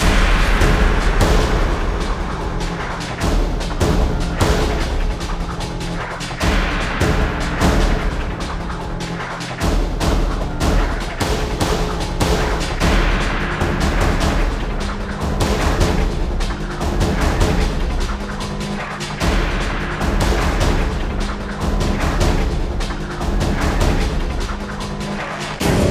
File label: Base Loop low; extinction deluxe edition; ps4 windows xbox one